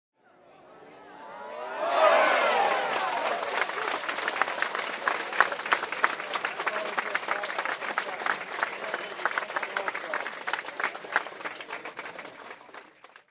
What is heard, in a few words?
Astonished Fans in a sports game